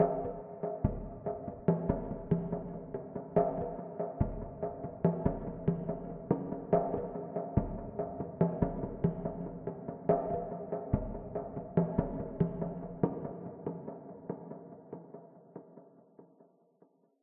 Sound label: Djemba